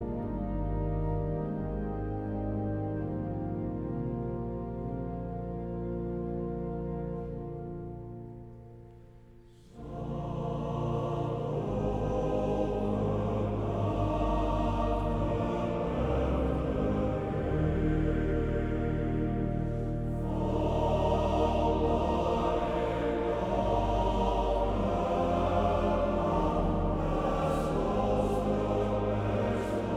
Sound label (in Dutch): Koor (mannen); Rehoboth; Psalm 121